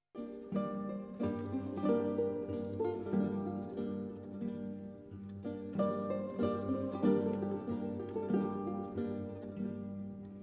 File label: Renaissance Recorders Ensemble; Musica Antiqua; Schiarazula Marazula